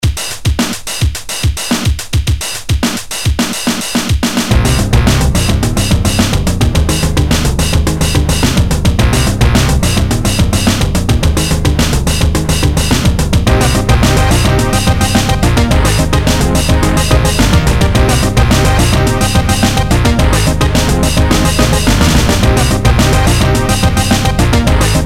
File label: Funky